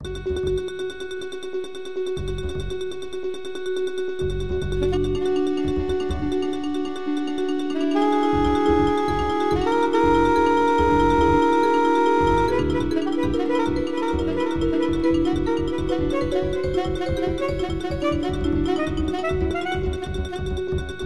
three, synthojazz, for soprano sax, bass and vibes; made from this muscript; impro